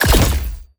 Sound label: planet rock explode